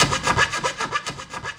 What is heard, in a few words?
Synth Perc; Dream Zone